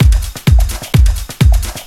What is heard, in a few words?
Latin Loop 128 BPM; Best Service; House Definition VOL-1